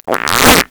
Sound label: ripper, farts